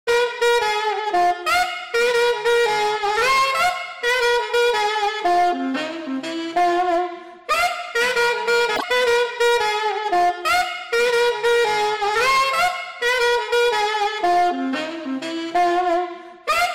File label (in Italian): Saxofono